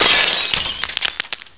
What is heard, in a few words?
glass break M; svencoop